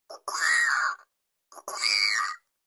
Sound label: crow cocaa